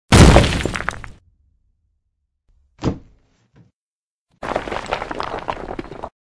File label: drop boulder